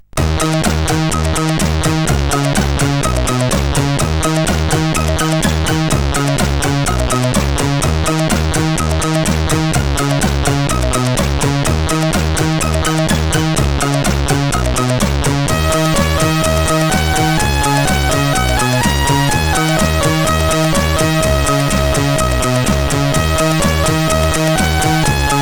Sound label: Twist tune, sid 8580R5; Captain Rock; Twist tune 3